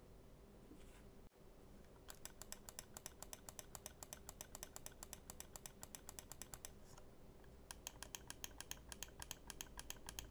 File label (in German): Daumentasten